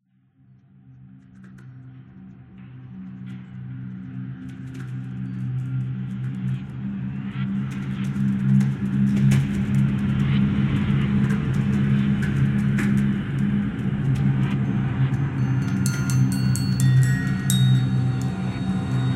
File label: Bells Ambient